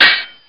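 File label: blade drop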